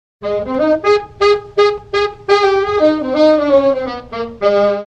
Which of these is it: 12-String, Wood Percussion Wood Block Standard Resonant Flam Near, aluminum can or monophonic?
monophonic